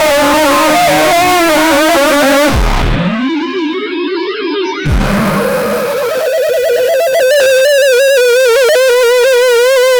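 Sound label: heavy realistic, strained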